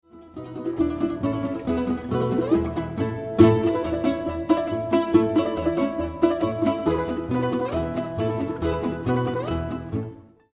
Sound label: strings